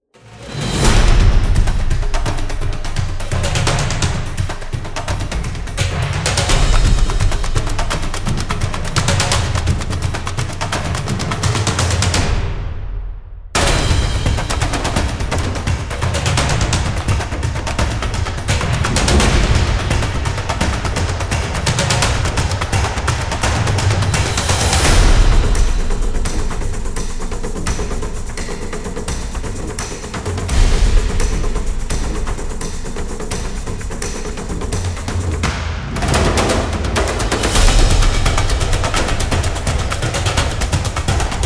percussion only version

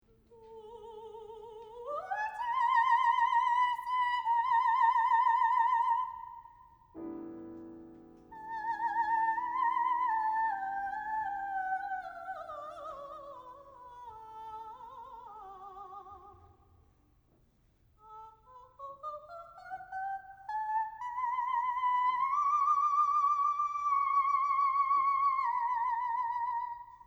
choir

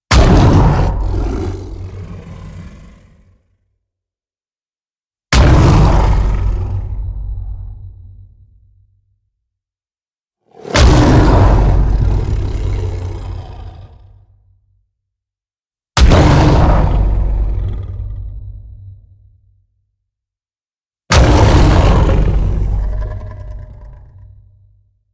hit roaring lion

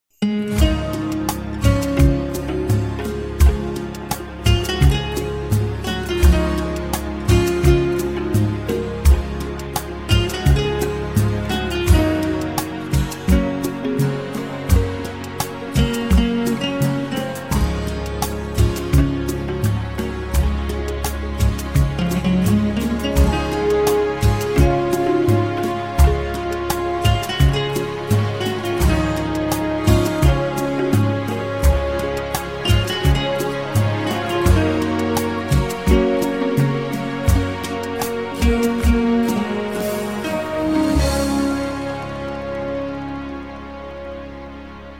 instrumental

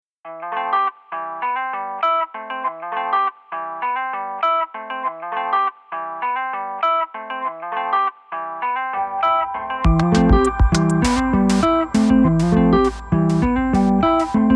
unplugged instrumental

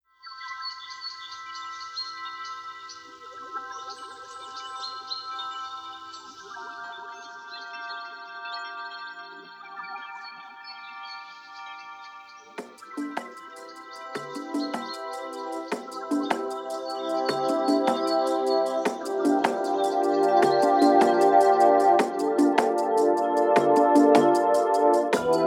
electronic bird

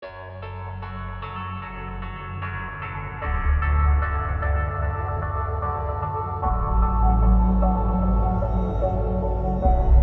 perc ending

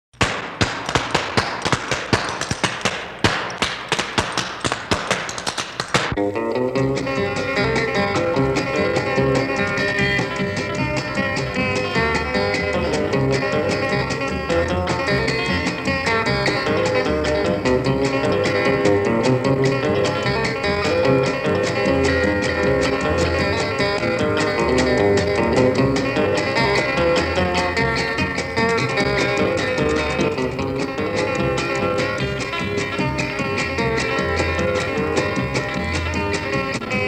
country jam instrumental

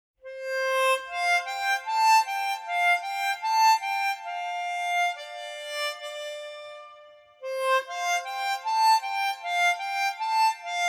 harmonica

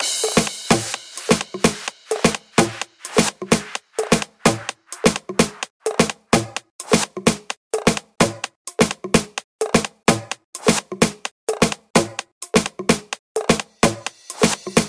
flamenco club mix

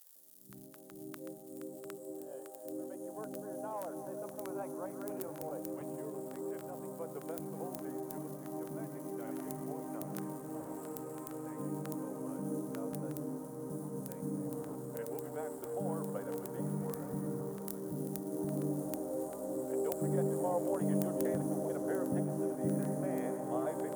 ambient version

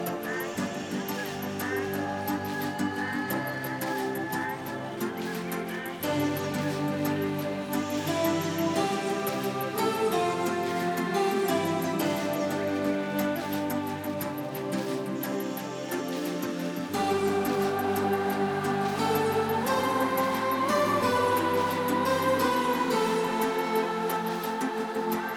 forest hymn long ambient version

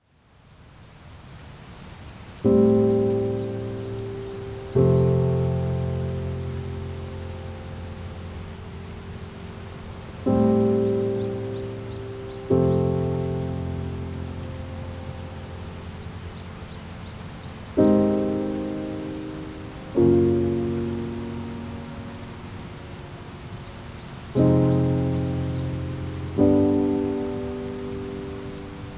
piano jazzy autumn rain music

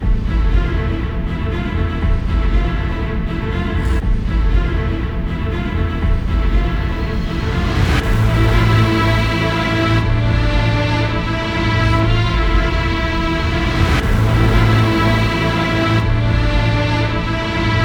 no choir no percussion

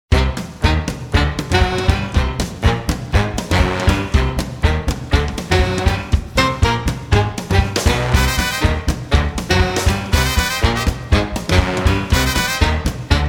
swing

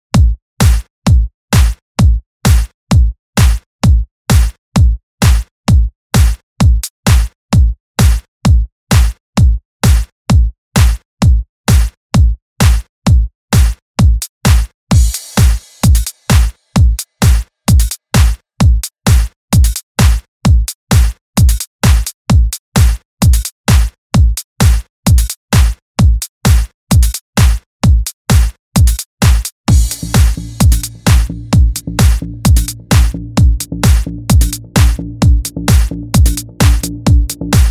rain vocal mix

